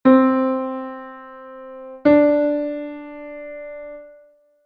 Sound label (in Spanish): tono-semitono do re; Listen to the intervals and drag each card to its container.